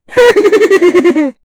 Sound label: demon laughing contemptuo